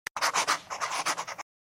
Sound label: pencil